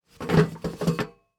Foley Footstep Cowboy Boots Stumble On Metal Platform; Foley Footsteps